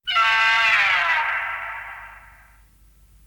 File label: roar; anguirus 1972